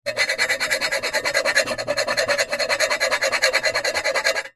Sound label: foley metal knife sharp tip scrapes scratch fast metal surface